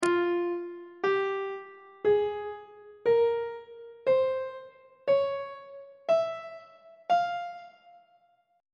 f harmonic minor scale note positions on treble clef